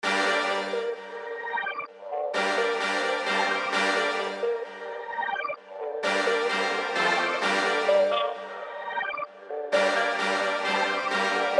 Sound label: 130BPM Horns Trap